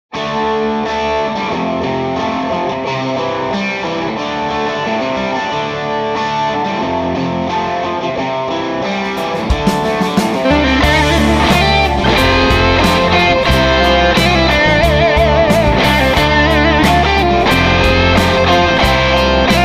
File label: Irish Rock Strat, tube amps